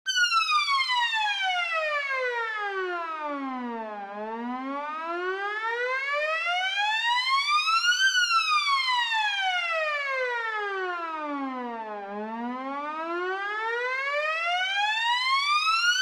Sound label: FX; PatchFactory